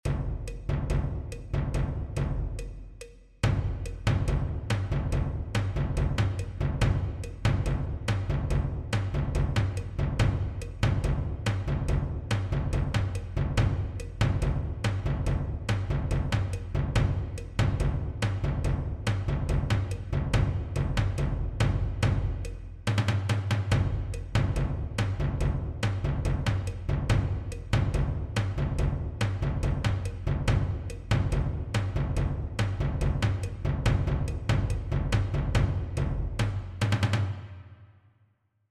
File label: perc; percussion